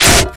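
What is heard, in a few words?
blade slice robot; melee impacts; Garry's Mod; tf2; mvm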